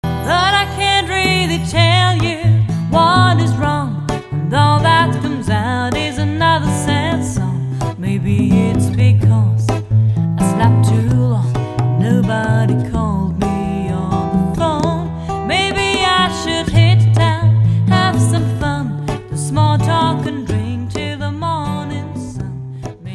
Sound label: sad song